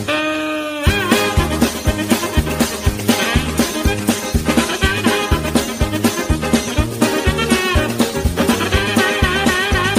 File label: cartoon chase